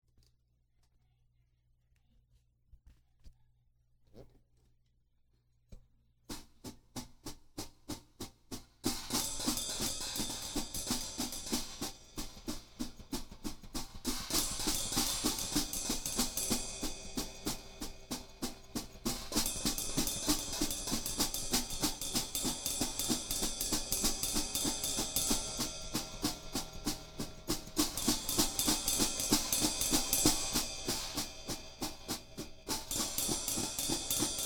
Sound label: Drum Interlude; hyper terrestrial